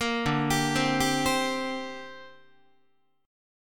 D 7th Suspended 4th Sharp 5th down Guitar; D7sus4#5 arpeggiated

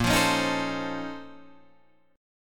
A sharp Minor Major 11th, Guitar, Drop D

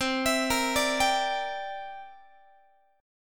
C 9th, Guitar, chord; arpeggiated

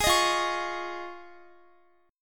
F Minor 7th Sharp 5th, Guitar; strummed

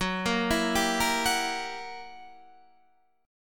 Gb 7th Flat 9th Gb Guitar Open D

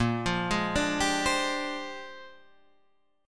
A sharp 13th, Guitar Standard; arpeggiated; JGuitar

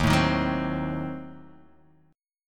Eb Diminished 7th, chord; Ebdim7 strummed; x,6,7,8,6,7,x,x; JGuitar

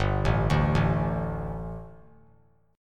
G Suspended 2nd G, down

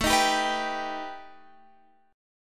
B Augmented 7th A

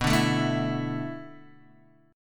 B Minor 11th B down Guitar Open D

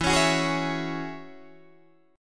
E 6th Add 9th E down Guitar Open D

